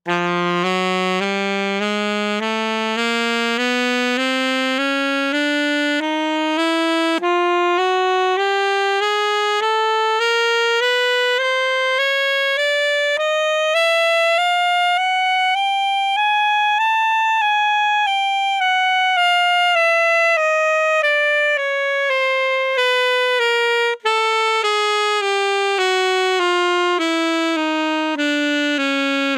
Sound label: alto Chromatic Scale; ProOne Cryo